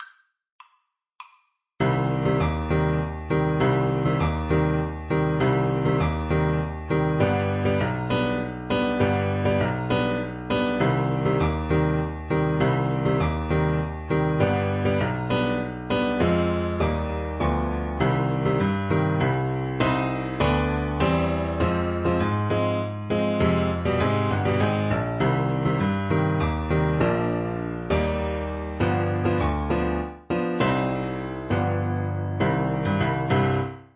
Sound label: French Horn